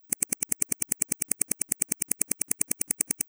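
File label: Flash clicks reverse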